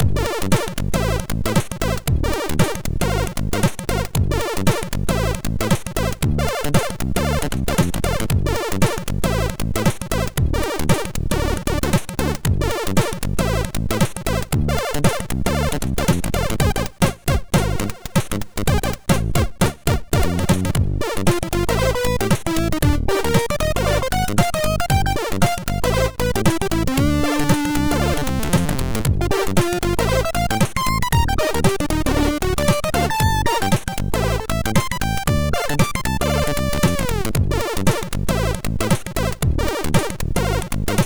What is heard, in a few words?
Camel Funk sid MOS6581R2; hvsc